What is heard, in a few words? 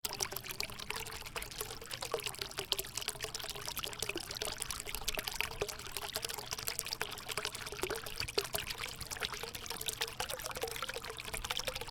fountain water bubbling